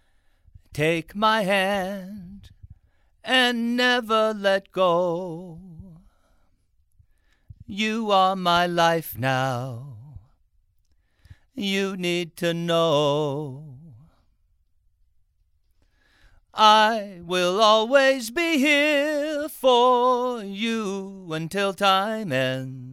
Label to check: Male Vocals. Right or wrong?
Right